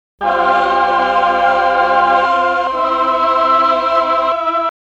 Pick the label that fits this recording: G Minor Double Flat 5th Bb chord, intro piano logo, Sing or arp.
Sing